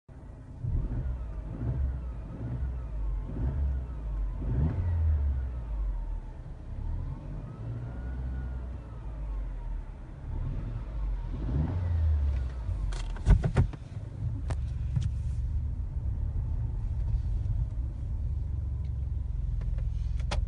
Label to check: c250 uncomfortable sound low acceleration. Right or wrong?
Right